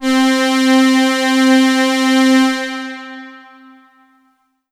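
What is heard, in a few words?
Yamaha V50 Bright Strings C4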